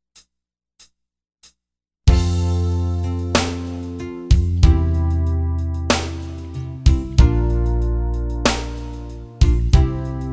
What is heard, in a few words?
No lead guitar No vocals Key of F